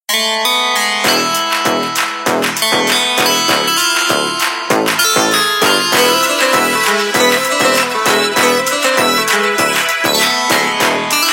Danceholic; Nokia 6125; China

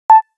Message Notify, system; Nokia; 6708

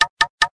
Knock, notification; LG; L20-D105f

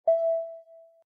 Keyboard Dock; Asus; Eee Pad Transformer (TF101)

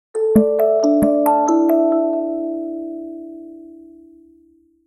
Calendar, Nokia Tones; Windows Phone apps

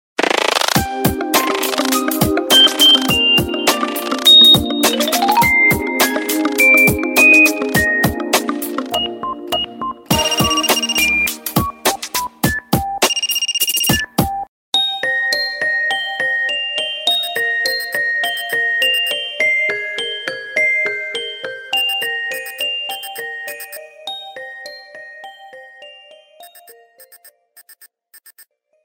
Call, ringtones; Qiku Luna Youth; Didu Call

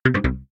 Email Failed; Coolpad; C558

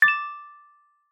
notification decorative; Google; Material Design sounds

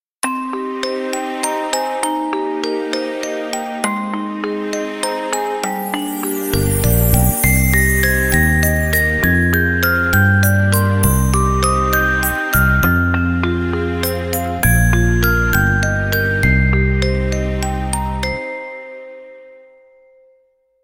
Music Box, ringtones; Hisense U961

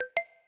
Charger Connection; Alcatel OT-903

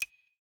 HW Touch; Samsung SM-W2015-Golden2

